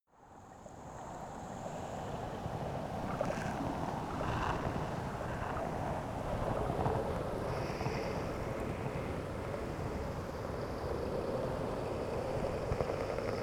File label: water fx windy